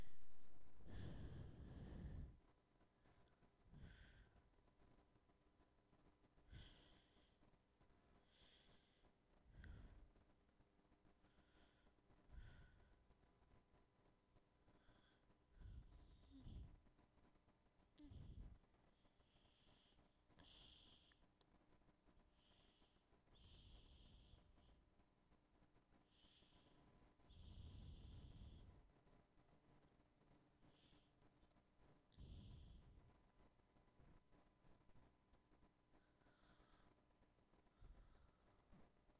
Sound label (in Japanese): 吐息